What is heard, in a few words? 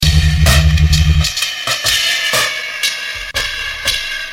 Heavy Newsms